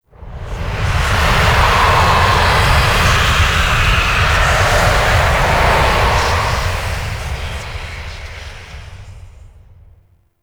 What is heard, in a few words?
Drop Off, Vehicles Flight; Warframe; Liset; Stalker Liset Skin